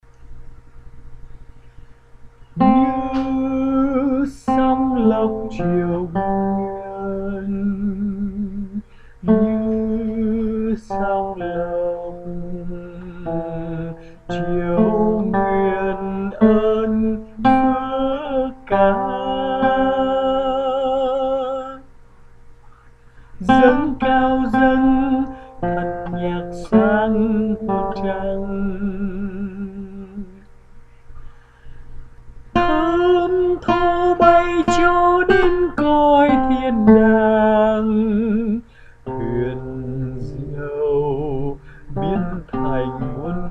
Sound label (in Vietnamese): bè Sop